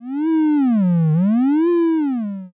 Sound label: metal strain